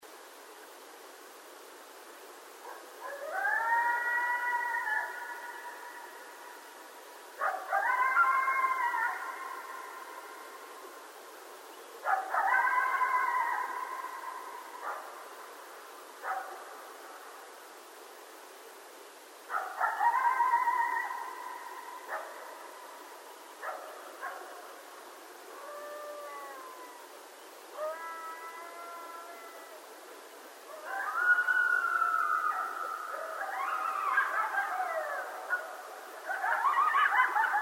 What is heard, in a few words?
North Park Howl